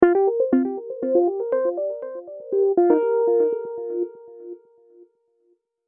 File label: music loop